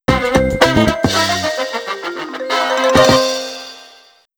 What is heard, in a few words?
shutdown; Samsung SPH-M500; Startup and Shutdown